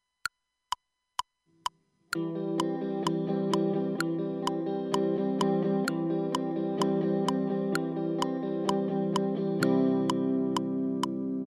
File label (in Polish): wstęp akord C